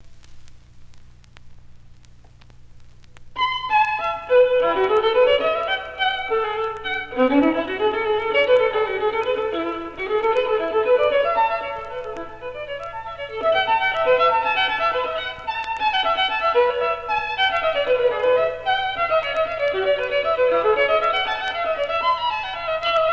Partita No. 3 In E Major For Unaccompanied Violin Gigue